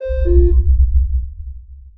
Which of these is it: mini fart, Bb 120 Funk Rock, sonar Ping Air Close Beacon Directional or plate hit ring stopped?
sonar Ping Air Close Beacon Directional